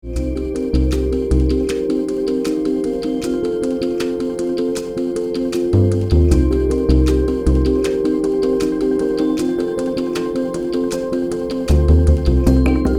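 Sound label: Music Toolkit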